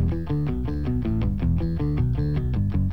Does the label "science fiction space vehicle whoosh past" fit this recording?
No